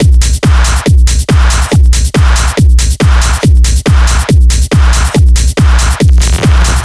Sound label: Zapper Beat, techno-trance